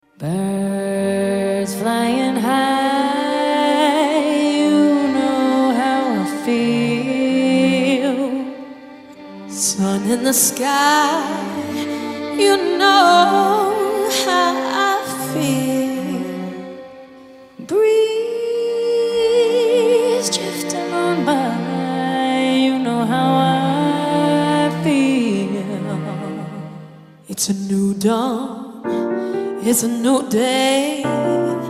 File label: Jazz; Adult